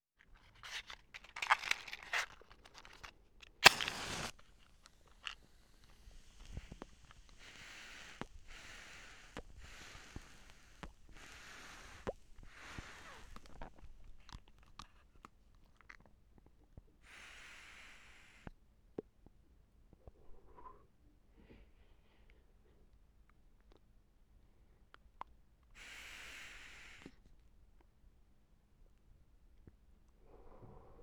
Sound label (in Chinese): 烟斗